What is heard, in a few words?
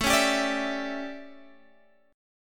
A 11th down Guitar Open F, chordshape; strummed